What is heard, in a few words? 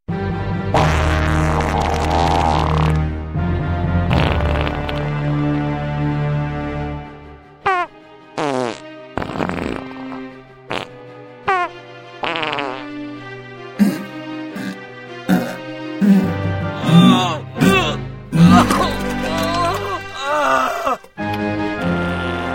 Fart Diarrhea